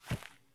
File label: run grass low; Escape from Tarkov